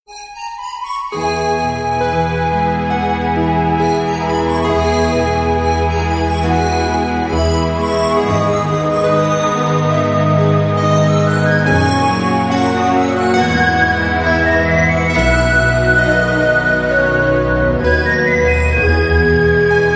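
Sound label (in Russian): krasivaya melodiya, beautiful melody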